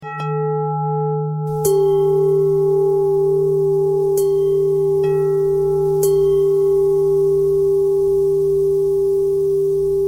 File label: bell thread bend thread; bells